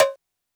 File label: Cowbell, drumkits 707